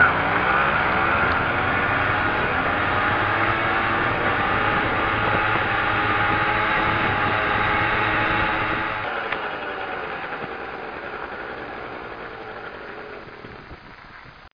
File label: background; MotoCross